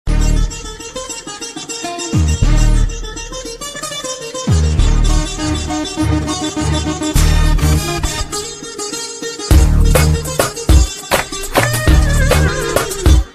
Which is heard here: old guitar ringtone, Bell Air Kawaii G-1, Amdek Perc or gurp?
old guitar ringtone